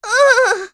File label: Damage, Vox; Pansirone; kings raid; en